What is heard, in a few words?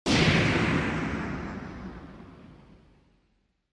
Impact High; Onoma